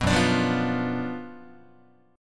Gb Major 7th Suspended 4th Sharp 5th, down, Guitar; strummed; 2,2,5,3,3,4; JGuitar